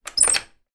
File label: household key mortice in door turn